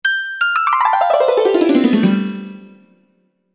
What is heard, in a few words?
blackout dulcimer; anime